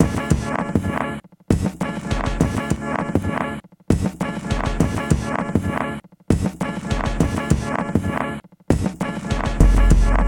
rave hits, lolicore

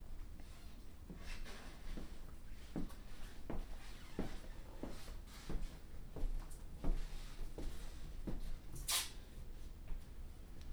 Creaking Floor; Working With My Grandma 4.28.2016

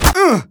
pain, player; Team Fortress 2

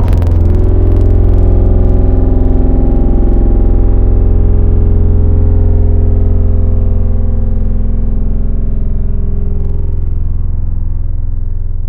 Chroma, Tropical Mallets; Synthetic's Serum Collection; SparkPackers

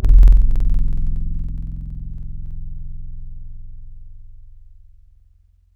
Fingerbass, wavetables; Synthetic's Serum Collection; Standalone-Music SYMPHONY Wavetables

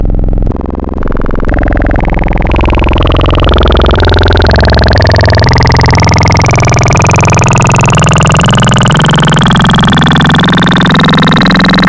Fm, Wavetables; Synthetic's Serum Collection; Cymatics - Nuclear for Xfer Serum Wavetables; H-Series I

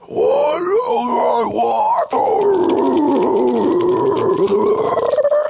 HI CHEW WRAPPER FINGERING

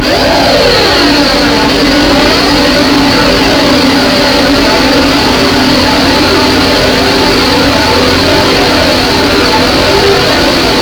harsh noise noisecore noise ambient electronica; lolicore

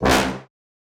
Brass Heavy; Aubit; Gray Vol. 2 Noises

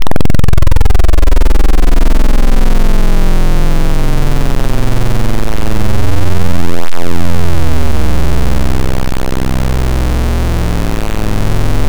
Digital Expansion; Synthetic's Serum Collection; ESW Core Wavetables; Echo Sound Works Core Tables

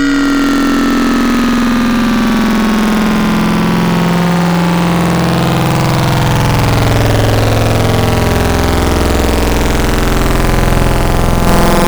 Phasebend, Serum Wavetables; Synthetic's Serum Collection; Cymatics - Nuclear for Xfer Serum Wavetables

